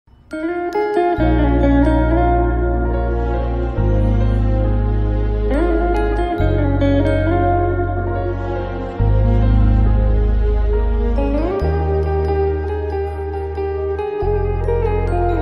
sad bgm heroine engagement bgm; Ala Vaikunthapurramuloo

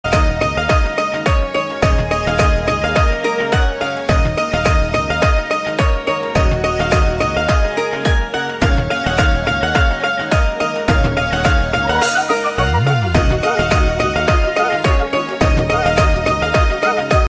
song ringtone instrument

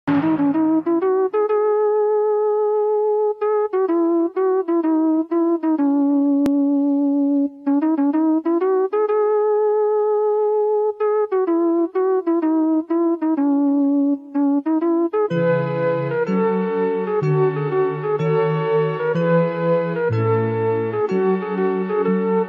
romantic theme music; punnagai mannan

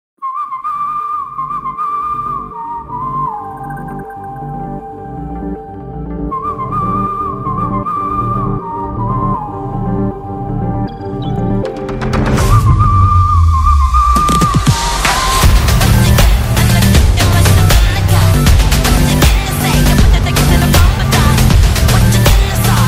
whistle instrumental ringtone bgm ringtone; Jawan; shah rukh khan

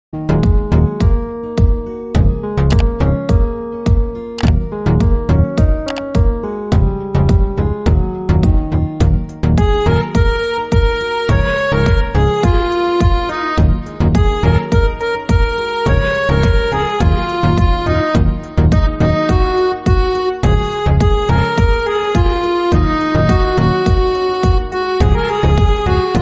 so so ga song ringtone love music ringtone

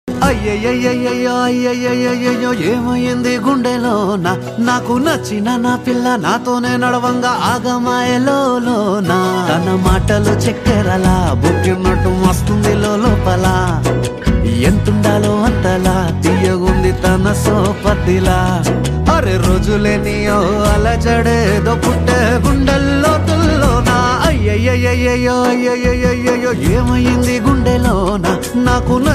Telugu love ringtone romantic love song ringtone

